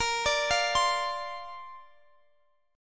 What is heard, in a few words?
A sharp Major Add 9th, Guitar, Standard; arpeggiated; x,x,20,19,18,20; capo=2